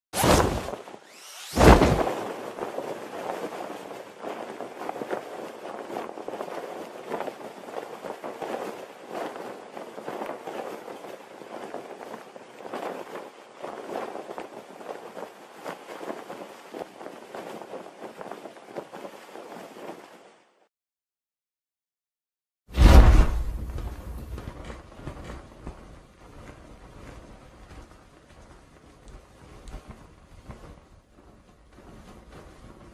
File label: Parachute; چتر نجات